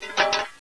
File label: metal; aomdc